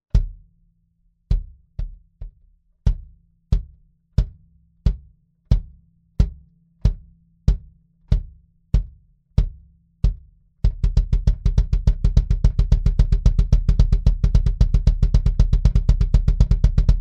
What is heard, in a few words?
Sub Kick Both